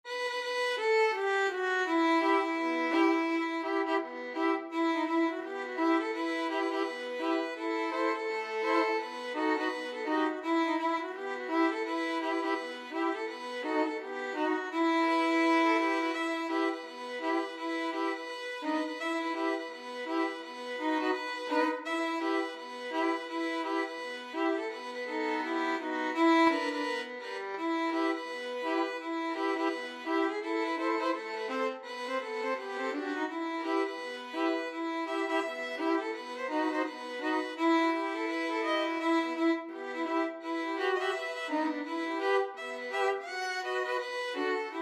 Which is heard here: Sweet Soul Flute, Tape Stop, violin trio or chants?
violin trio